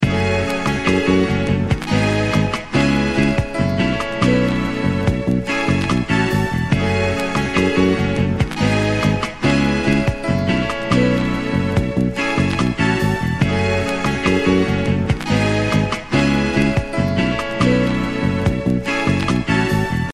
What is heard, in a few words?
Mellow Groove